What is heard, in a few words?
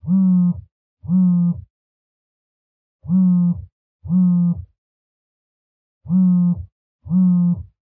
Cell Phone Vibration (Blanket)